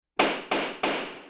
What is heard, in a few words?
3 time gavel hit